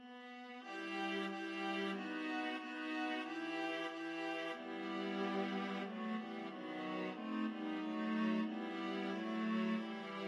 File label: viola trio; go down moses